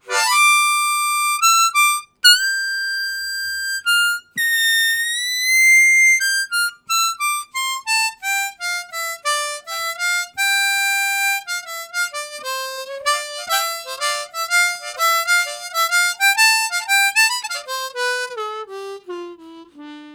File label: Harmonica, WAV Riffs; Zero G